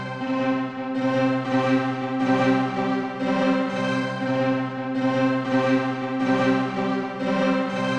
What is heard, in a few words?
120 C string, Trance, String Loops; Trance Explosion Vol1